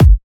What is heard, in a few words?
rich low end kick drum single shot g sharp key